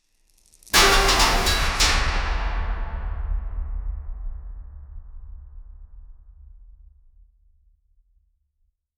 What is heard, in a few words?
ocean waves quick crashin; steel pan